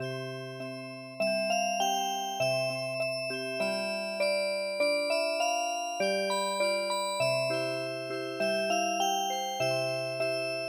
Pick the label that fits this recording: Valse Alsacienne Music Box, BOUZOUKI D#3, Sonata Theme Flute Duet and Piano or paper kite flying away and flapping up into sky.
Valse Alsacienne Music Box